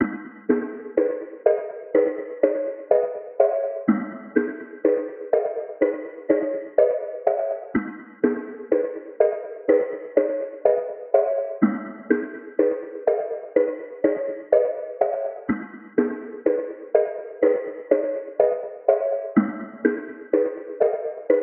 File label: 124 BPM G# Min Mallet, Loop Stems; Melody; Cymatics; Cymatics - 2022 Melody USB Expansion; Vandal